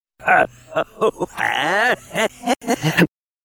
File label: laugh backwards